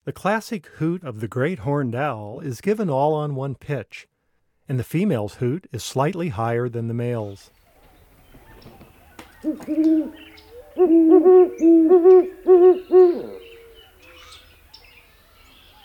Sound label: Great Horned Owl; North America; Common Western Species; Know Your Bird Sounds